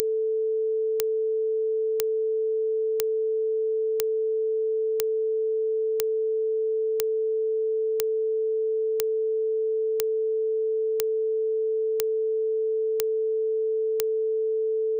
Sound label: crest high